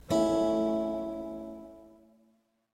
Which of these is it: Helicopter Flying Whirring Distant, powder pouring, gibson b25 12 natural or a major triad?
a major triad